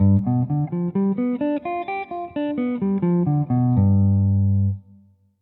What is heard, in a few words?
Arp 7; scales Gmaj